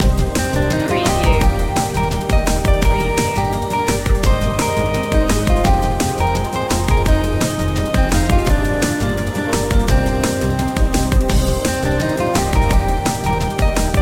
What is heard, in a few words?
uk chillax